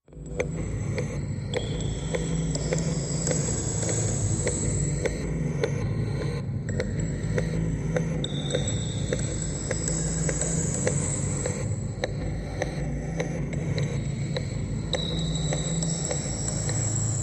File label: Tunnel Countdown, Machine, Clock, High Grinding, Motion